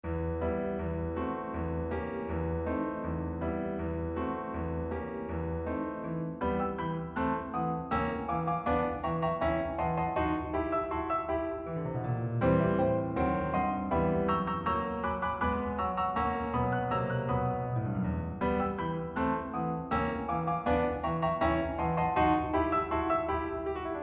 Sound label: boesendorfer 290 hall; zuckerfee